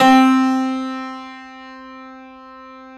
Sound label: Classical Piano ff C2; PS-53 Total Piano; Brilliand Classical Piano